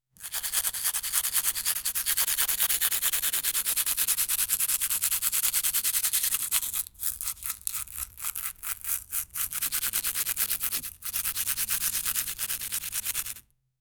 the sound of spreading, brush

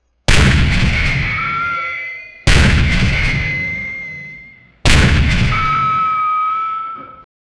soft fade medieval war, fire

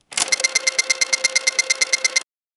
slot machine loose

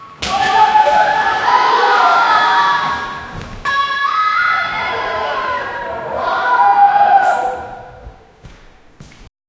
echoing indoor swimming p

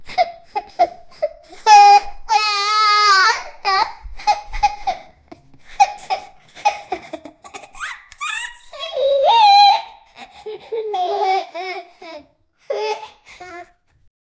a 1 year old toddler laug; amusement

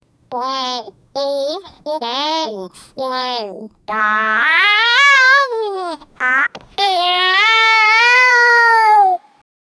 happy child

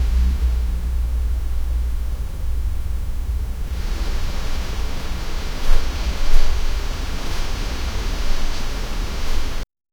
urgent rustle of clothing; body fall

